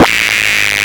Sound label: Static 180, Hard & Loud Techno; USB Soundscan vol.01; AKAI